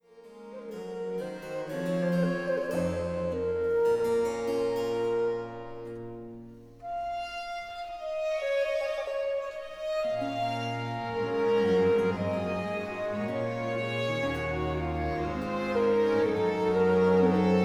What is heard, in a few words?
Orchestral Suite No 2 in B Minor Sarabande; BWV 1067